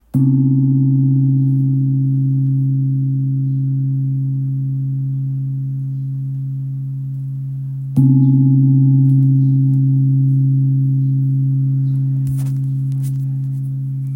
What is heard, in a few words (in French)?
Gong birman Do diese 6.7kg